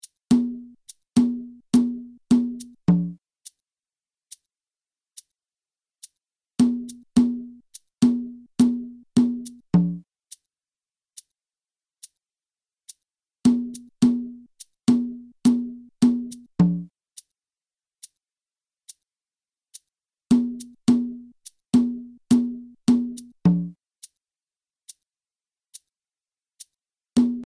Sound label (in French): guarapachangueo cajon lent